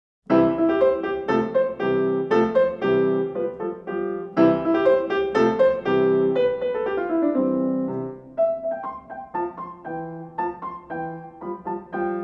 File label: Festive Sonatina Vivace piano